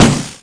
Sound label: Clicky Gadget Things